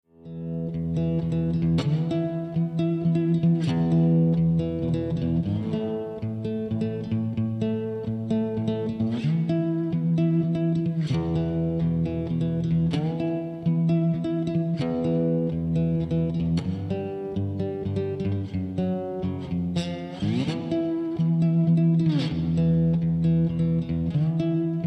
Blues guitar

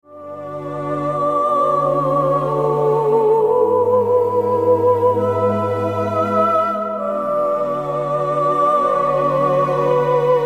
Vocalise

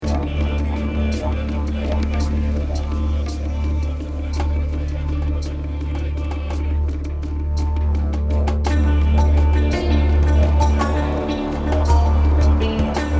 Collage Chant

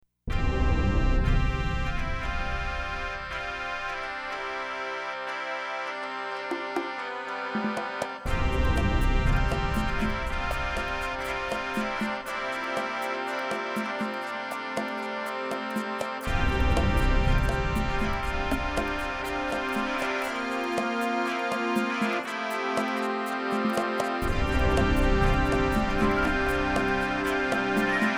Collage bagpipes

